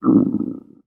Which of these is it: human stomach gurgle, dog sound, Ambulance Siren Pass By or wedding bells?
human stomach gurgle